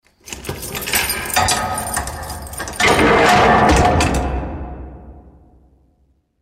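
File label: otrkryvaut dveri turmy, opening prison doors; звуки тюрьмы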